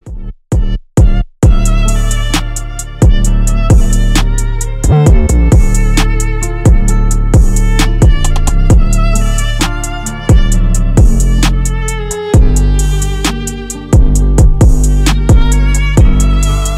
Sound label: trap remix